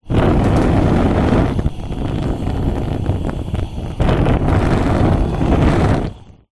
household cooker oven hob gas flame blow movement wind; zapsplat